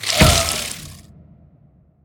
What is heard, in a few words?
spitter death big, creatures